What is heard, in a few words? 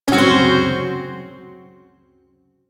Shawzin Hirajoshi Chord; Warframe; Duviri Shawzin